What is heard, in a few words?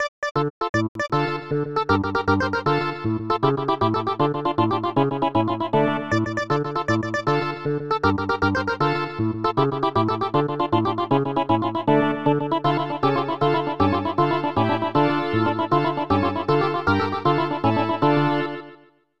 Italian Dance; Super RBI Baseball 1995 SNES